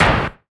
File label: FX Dance